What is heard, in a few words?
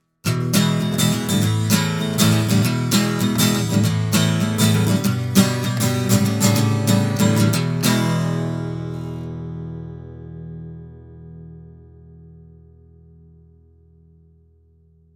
Acc Gtr vintage bypass + hpf; Warm Audio